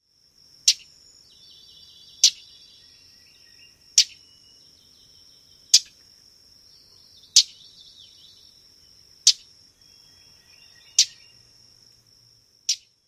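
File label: Common Yellowthroat tschat calls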